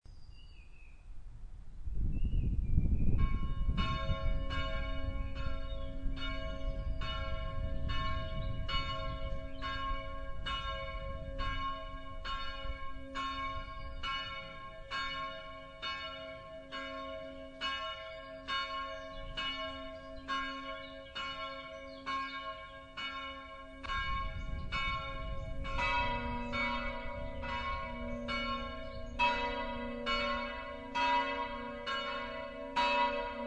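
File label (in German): Tonaufnahme cis1 e1 fis1 a1 h1 cis2, mehrstimmige Geläute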